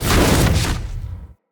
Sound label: Casting Device Cast Dual; Fenn